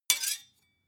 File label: sword hit scrape